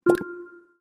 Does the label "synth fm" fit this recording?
No